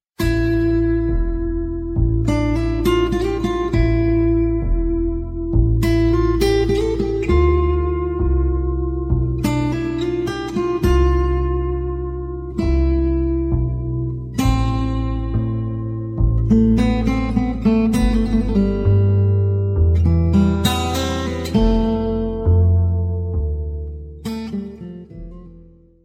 Guitar Tone

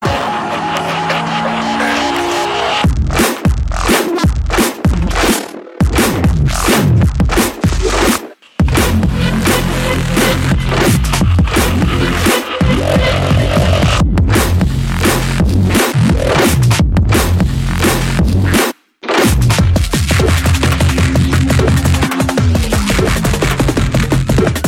March; Clarets

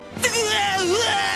Deeuaughh

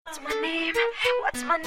Cool Msg Tone

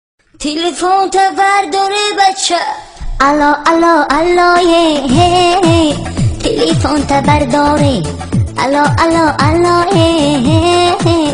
Pushto Ringtone

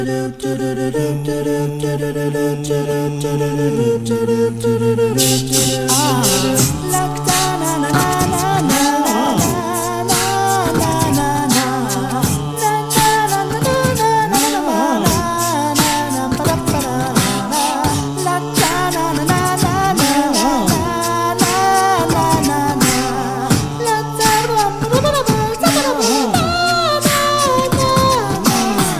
Acapella

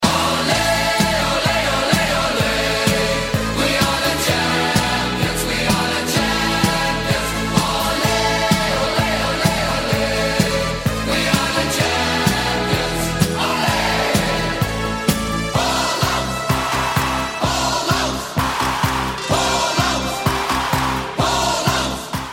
Ole Ole Ole Ole

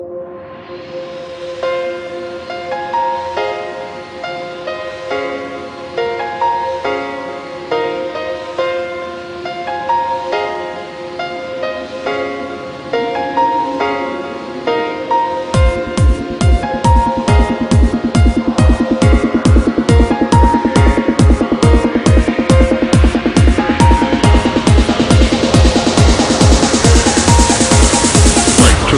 DUBSTEP; HUNGER GAMES

